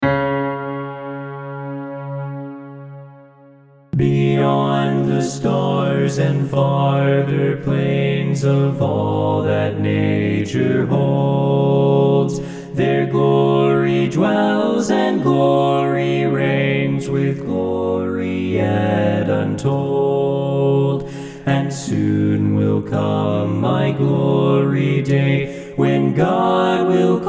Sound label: BASS